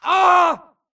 grunt death crush; Titanfall 2

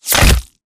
bullet generic head kill shot human; Titanfall 2

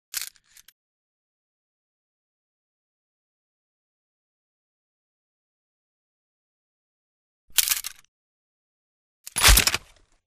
frontier gun cock; Commander sarah; Titanfall 2

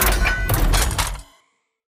Servo Jog; Titanfall 2

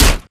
bullet light ballistic spectre; Titanfall 2

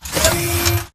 Mvmt Servo Light Medium; AI Stalker; Titanfall 2

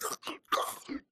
spectre chest punch idle grunt; Titanfall 2

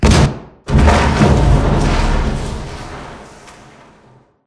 Explosion Rumble Interior Close Punch; Boomtown; Titanfall 2

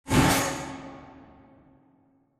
Assembly Conveyor Stop Close; Boomtown; Titanfall 2

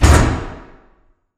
sliding door sm verb close start; beacon; Titanfall 2

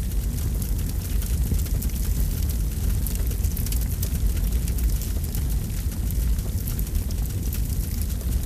fire loop medium crackle; colony; Titanfall 2

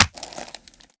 Chestpunch Neck Grab; Titanfall 2

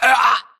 grunt death neck; Titanfall 2

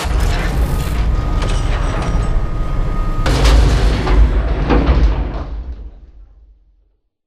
Control Room Lift Up; Beacon; Titanfall 2

